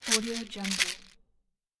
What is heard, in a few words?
pill box shake